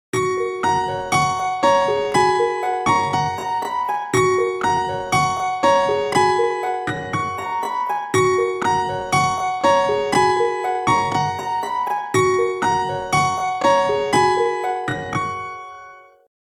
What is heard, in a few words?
Infinix GT 10 Pro Ringtone